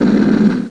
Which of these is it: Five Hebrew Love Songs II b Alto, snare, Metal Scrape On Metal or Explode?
Explode